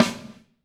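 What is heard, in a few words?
snare drum, Drums; Bob Clearmountain Drums I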